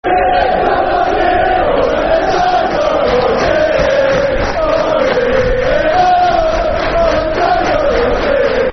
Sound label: Football Chant; Town Goes Marching In; Cheltenham Town Ctfc